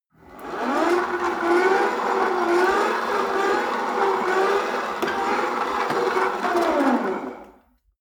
rope trainer pull sequence medium long, Fitnessstudio; sport freizeit